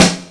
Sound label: beercan, snares real